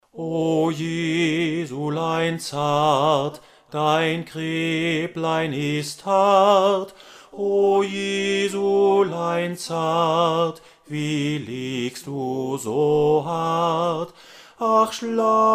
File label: o Jesulein bass